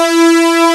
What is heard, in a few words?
MINIMOOG